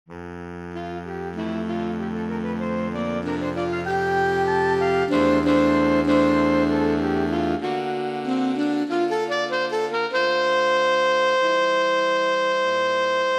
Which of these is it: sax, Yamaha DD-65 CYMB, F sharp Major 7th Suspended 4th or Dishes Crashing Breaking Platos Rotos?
sax